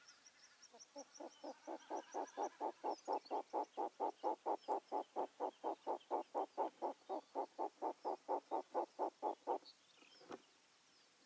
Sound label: Short eared Owl Asio flammeus, Ladakh